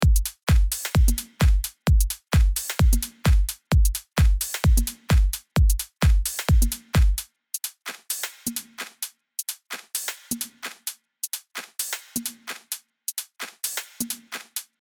Drums; Beat Service

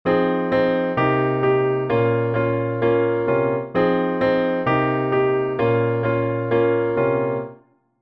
intro revoiced

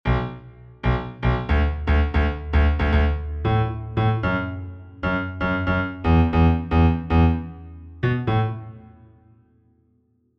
Bass

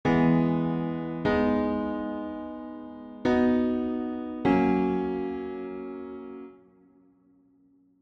Chords sustained

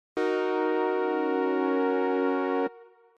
Chord Spectrum

